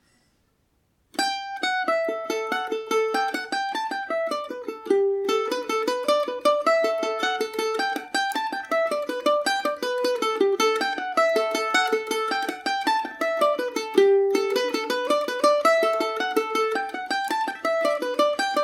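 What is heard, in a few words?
mug of brown ale a dorian, mandolin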